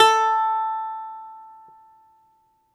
BALALAIKA, Russian Strings; E-MU Producer Series Vol. 7 – Old World Instruments

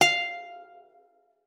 F5, guitar; Xsample VOL-2

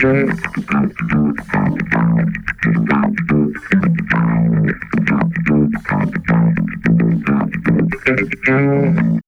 BASS, Funky Ass Loops; East West

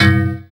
FX Dance; Sound & Vision - Gigapack II

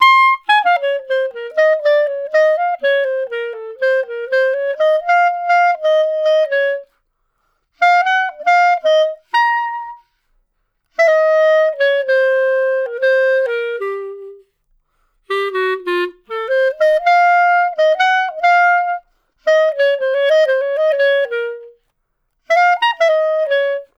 Clarinet One Shot, melody

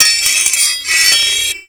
SW HIT, MORESWORD; Total Control - Action Cutz

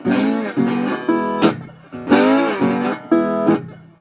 120, Blues Guitar; ILIO - Fretworks

